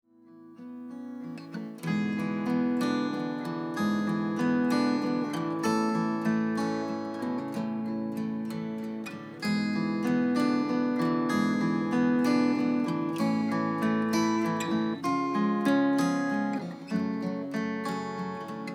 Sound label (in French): guitares